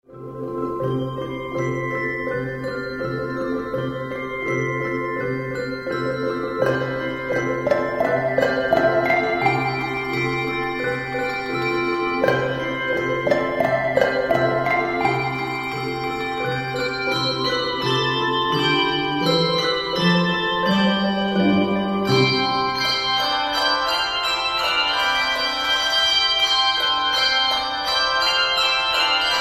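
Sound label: bells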